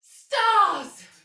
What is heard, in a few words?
Pain, Soldier Female, Battle; Dialog; Barks; Sinecure